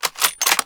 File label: perform bolt action